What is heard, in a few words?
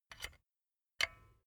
silicone gun bullet lock mechanism soft; berufe; handwerker; soundpool